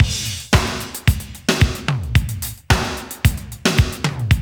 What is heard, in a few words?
Loop, Roland; Sound & Vision - Gigapack I